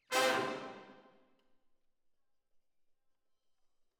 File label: ORTF, Jazz for piano